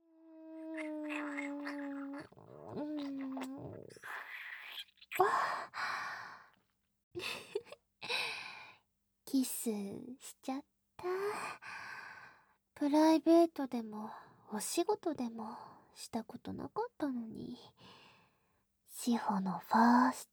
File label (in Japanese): ちゅっ♪ ちゅっ♪ ぶっちゅぅ～～…♪; おちんぽファーストキス